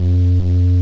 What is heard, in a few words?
bgm hum; warrior